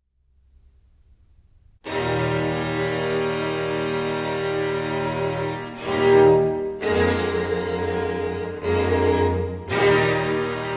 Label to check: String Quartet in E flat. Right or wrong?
Right